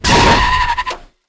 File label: drift; War Thunder